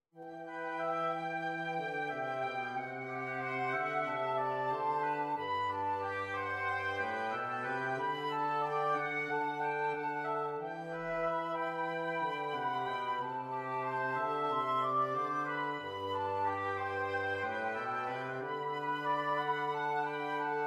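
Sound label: Like a bird woodwind trio, flute clarinet trio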